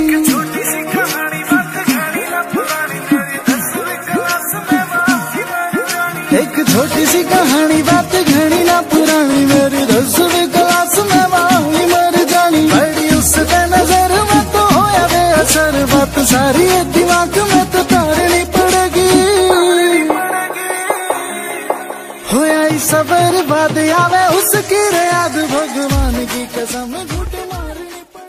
bollywood ringtone

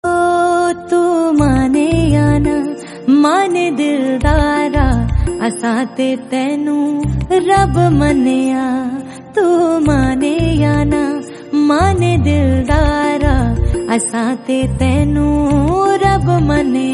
female song tone; bollywood

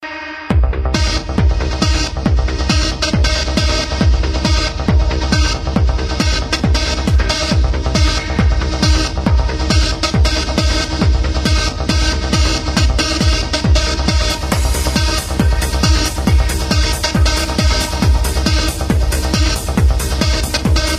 mix ringtone

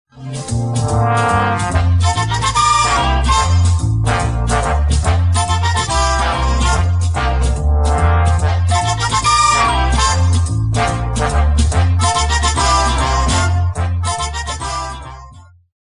special sound ringtone

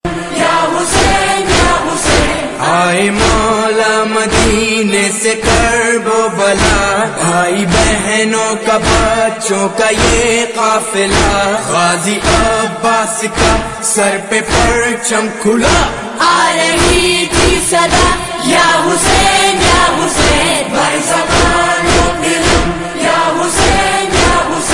noha; haey mola

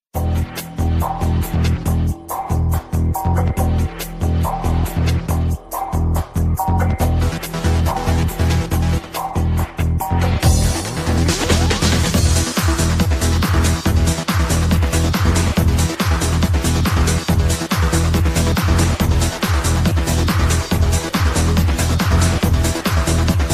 fast music ringtone; samsung ringtones

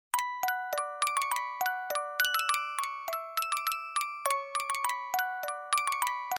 tin tin tin sms ringtone

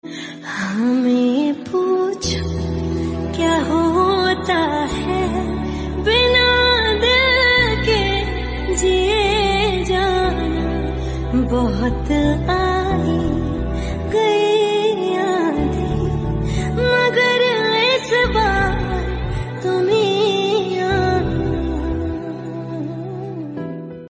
song sad ringtone

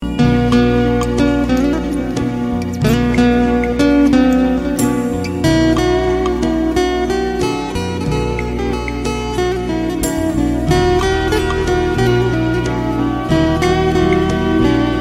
guitar ringtone

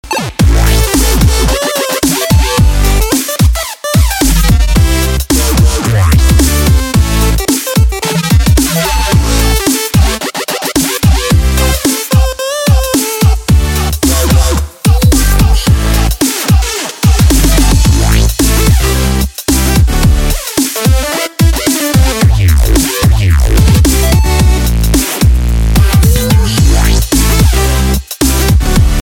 digital hop up ringtone; digital math; english ringtones

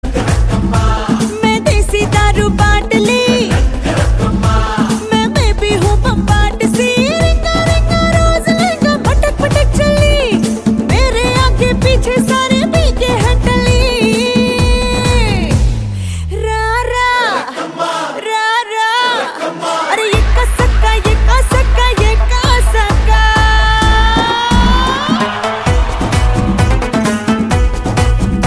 disco bar music tone ringtone, bollywood

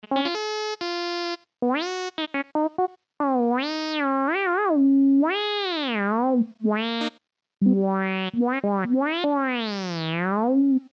wow wow wow most funny tone

